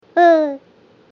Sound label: favorite unclick